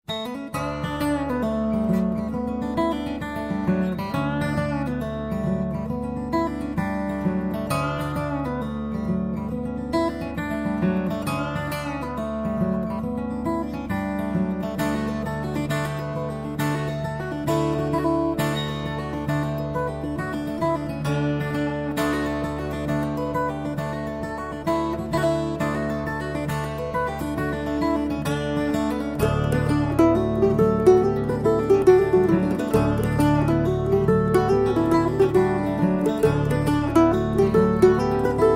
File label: celtic wedding